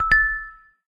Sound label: Pixies, notifications; MagicOS 7.1